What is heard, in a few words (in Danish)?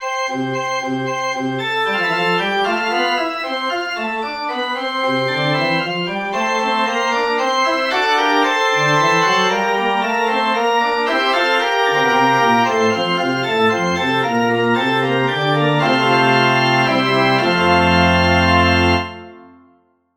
forspil denne er dagen, organ